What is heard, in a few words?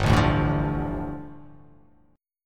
G 7th Suspended 2nd